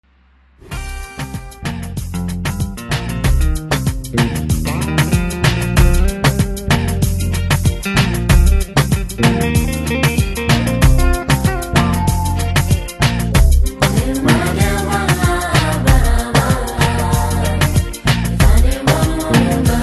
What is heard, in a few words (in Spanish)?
musica